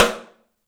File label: STEELS, 3D Drums, ROOM SINGLE